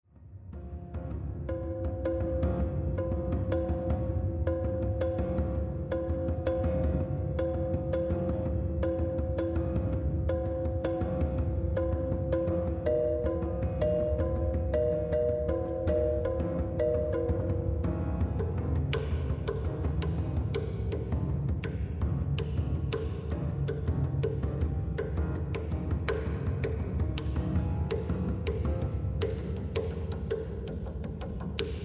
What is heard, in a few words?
prepared piano